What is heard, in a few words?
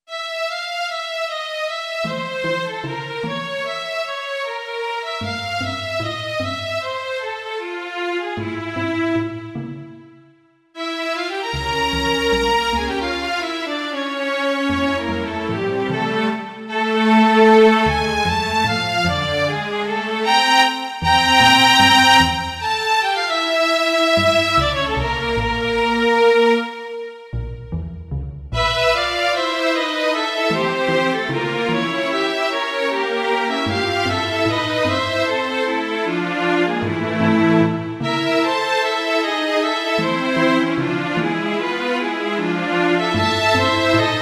orch midi; Damascus; 1st move